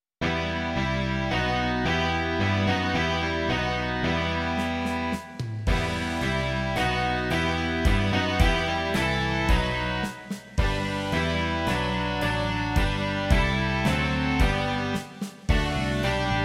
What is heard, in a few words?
rock